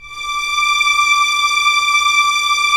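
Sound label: Strings Violins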